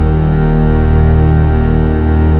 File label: SYN 2 STR, Dance Synth; Sound & Vision - Gigapack I CD 2 (Roland)